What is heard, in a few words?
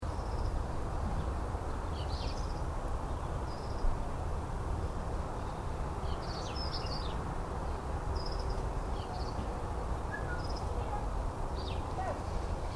Garden (Residential): Ambience, Birds, Slight Road In Background; Outdoors; Backgrounds; Outdoor Sound Effects; Stuart Duffield